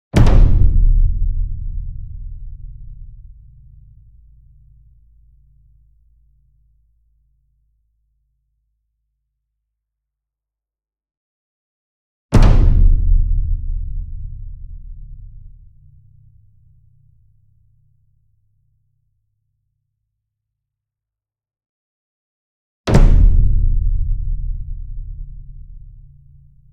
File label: ENSEMBLE DRUM Bass Drum Concert Flam Near; boom library; Cinematic Strikes Construction Kit